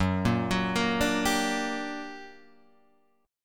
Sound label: G Minor Major 7th, Gb, guitar chord